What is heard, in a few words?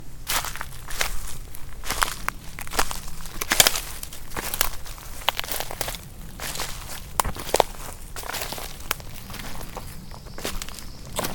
walking in fall forest; human